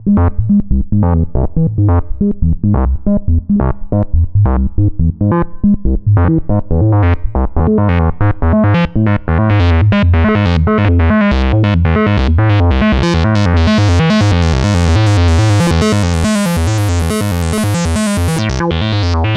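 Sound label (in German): Pocket Operator Modular 400 SQR PWM Filter, Synthesizer; Teenage Engineering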